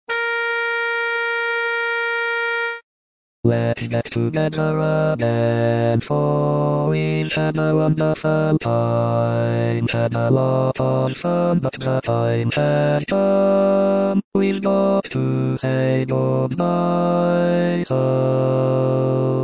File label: bass; teach tracks